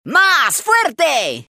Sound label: rock; rocktopus